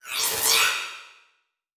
Transition Whoosh Alarm; Production Element; Title Transition; AdobeCC

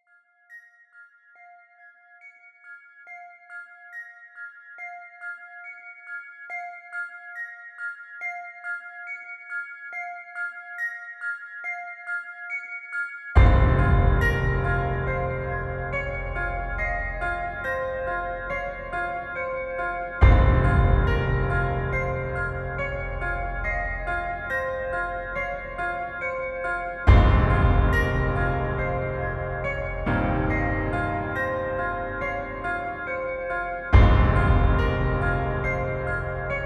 Bells Theme 70BPM, Cinematic Trailer Music

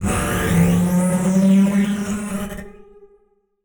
Title Transition Ascend; Adobe; Production Element

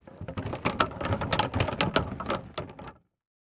Sports Bag Golf Bag Full Shaking Slow

